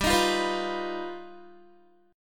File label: G Augmented 7th, Guitar; strummed